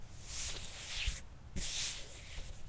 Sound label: Caress the Melon; Micro Pack